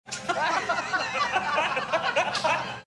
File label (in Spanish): risa malos; de robocop 2